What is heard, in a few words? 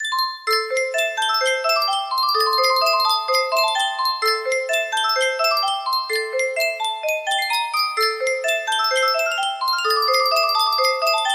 music box